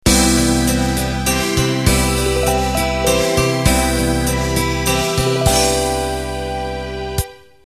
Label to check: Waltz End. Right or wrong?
Right